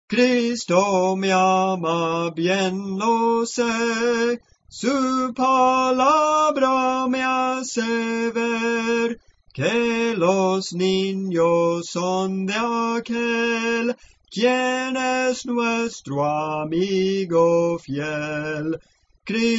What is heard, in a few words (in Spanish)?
Soprano, Cantos Espirituales; Soprano (melodía)